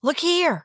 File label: look